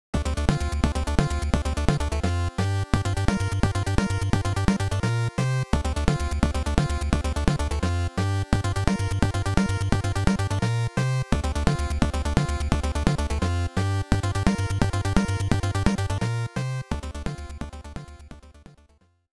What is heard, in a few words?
Victory; the cowabunga collection; Shredder Victory